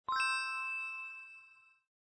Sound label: fortune Golden Gem Won; fortune Wheel